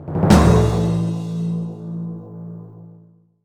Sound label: Jig; eigo de tabisuru little charo